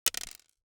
DICE on Hard Wood, Throw and Roll, Mini, 2 Two Dice; Samples; ArticulatedSounds; DICE Sfx Party (Extended)